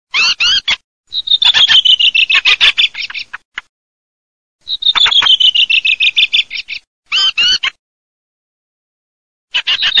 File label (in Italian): CESENA+SASSELLO